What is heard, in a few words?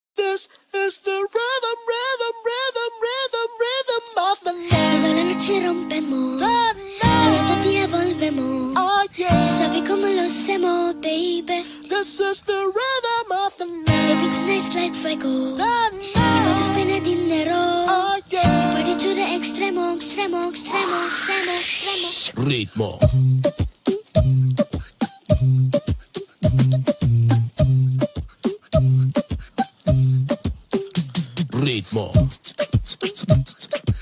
Beatbox; nhạc hot tiktok Trung Quốc Cover Father Daughter